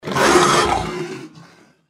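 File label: deathclaw power attack; npc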